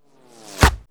Bullet Body Hit, Weapons & Explosions; Sony Pictures Sound Effects Series Volumes 1-10; Sony Creative Software

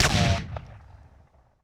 Benelli 12 Gauge Shotgun Blast; Weapons & Firearms; Sony Pictures Sound Effects Series Volumes 1-10; Sony Creative Software

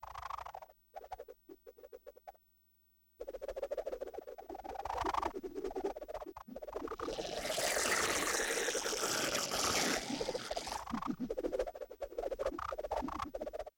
Whipper Bug, Vocals - Humanoid; Sony Pictures Sound Effects Series Volumes 1-10; Sony Creative Software

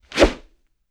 Sword Whoosh; Weapons & Explosions; Sony Pictures Sound Effects Series Volumes 1-10; Sony Creative Software

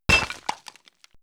Sword Impact With Bones; Weapons & Explosions; Sony Pictures Sound Effects Series Volumes 1-10; Sony Creative Software

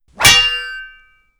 Sword Swipe And Klang; Weapons & Explosions; Sony Pictures Sound Effects Series Volumes 1-10; Sony Creative Software

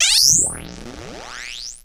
Comm Static Beep; Electronics; Sony Pictures Sound Effects Series Volumes 1-10; Sony Creative Software

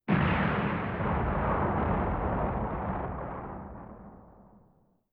Explosion Distant Large; Weapons & Explosions; Sony Pictures Sound Effects Series Volumes 1-10; Sony Creative Software

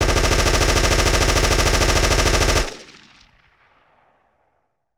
Sub-Machine Gun 9mm Long Burst; Weapons & Firearms; Sony Pictures Sound Effects Series Volumes 1-10; Sony Creative Software

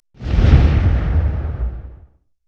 Explosion, 5.1 Surround; Sony Pictures Sound Effects Series Volumes 1-10; Sony Creative Software

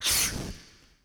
Flamethrower Burst; Weapons & Explosions; Sony Pictures Sound Effects Series Volumes 1-10; Sony Creative Software

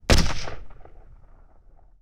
AK-47 Single Shot; Weapons & Firearms; Sony Pictures Sound Effects Series Volumes 1-10; Sony Creative Software

